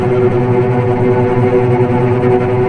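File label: cellos tremolo B2 piano right, string; East West - The Ultimate String Collection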